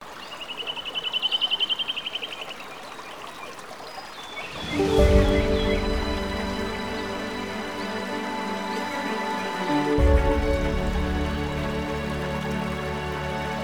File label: Pounding Rain